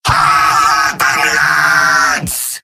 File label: battlecry; demoman; video games; source engine; tf; mvm